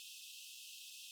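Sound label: ORGAN Flute noise, Farfisa; Keyboards of The 60's and 70's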